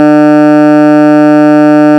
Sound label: pitch freq 150 no fundamental